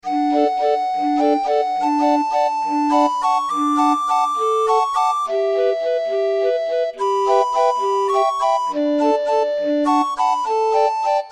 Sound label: les roses, orgue barbarie